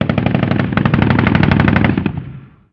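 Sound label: gun assault medium; Call of Duty Modern Warfare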